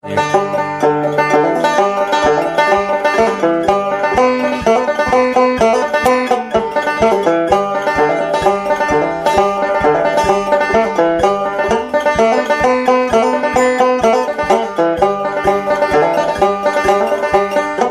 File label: BANJO FRAGMENT